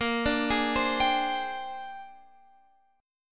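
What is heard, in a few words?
A sharp 13th chord, Guitar, Drop D tuning; down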